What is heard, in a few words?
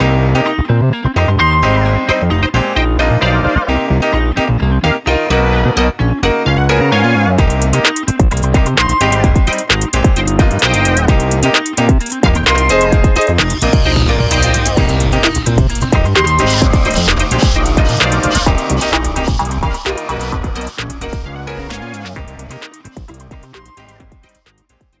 Roller Disco